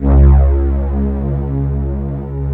VOXY PAD C2 L, KORG, Analog; USB Soundscan vol.49; Analog Fantasies; AKAI

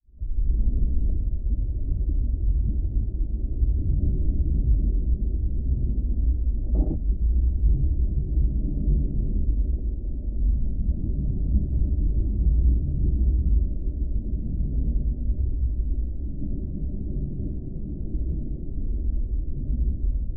Geofon, Vibration, Dark, Metal; underground sounds

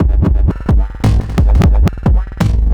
Glitched Beat 175BPM; glitch kit

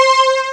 Strings, Amiga Soundtracker; ST-XX Amiga Soundtracker Sample Packs

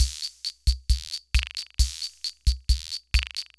Loop, sr-95; classic machines

